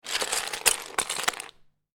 Lego Rattling Lego Pieces; Miscellaneous Toy Sound Effects; LynneMusic

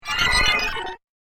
Strange Kind Of Data Blast; Communication Sound Effects; star wars / trek style

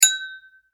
Foley Glass Toast Cheers Clink; Toasts

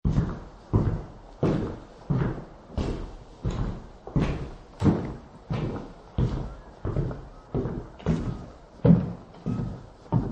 Foosteps Big Wooden Floor; Footstep Sound Effects; Human

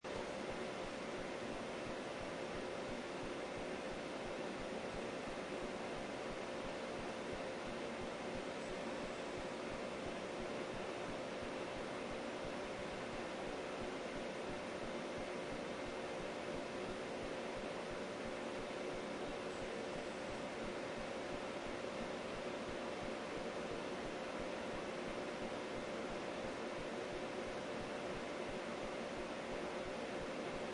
Office Room Tone, Office sound, pure room tone; Small office with various computers running. No 'activities', only the pure office b; Lynne Music

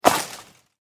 Impact Branch Tree; Snaps

